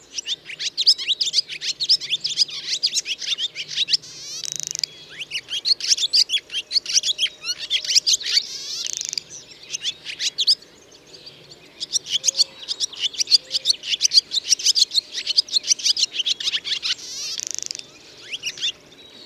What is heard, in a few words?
Barn Swallow Hirundo rustica erythrogaster